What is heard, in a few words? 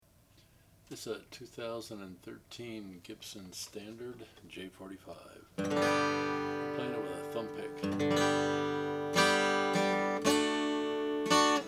j 45 standard tobacco sunburst, guitars; gibson